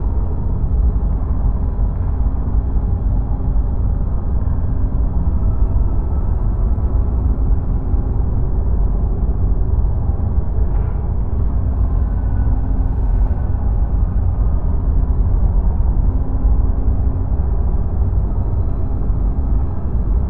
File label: nemesis battleship hum; Stellaris